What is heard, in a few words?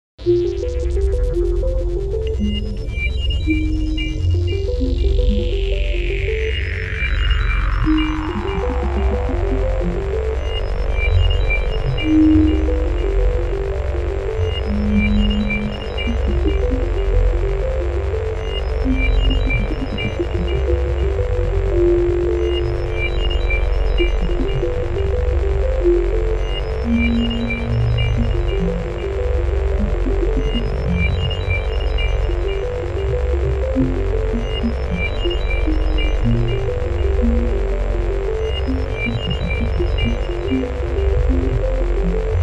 firefly music; Milk outside a bag of milk... OST (2021)